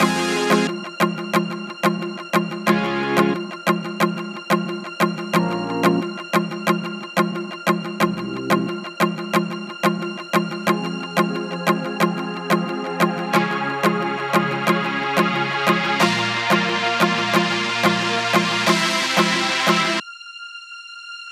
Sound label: Tek Blaze Lead F